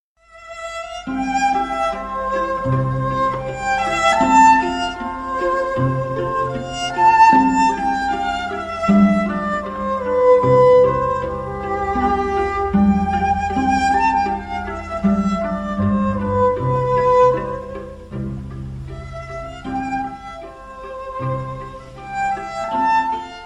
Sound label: String Quartet Serenade; F.J.Haydn; String Quartet No5